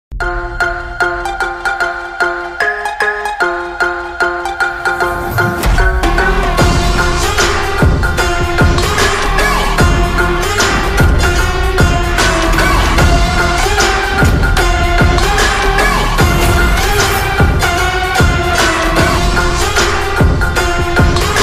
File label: bgm ringtones; ismart shankar